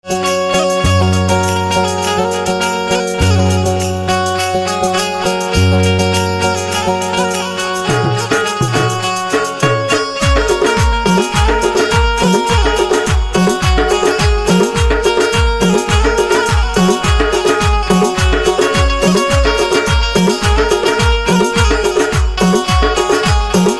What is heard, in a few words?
Banjo